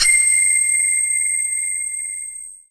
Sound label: FAIRBELL C6; Greytsounds; Scott Peers Sound Engineering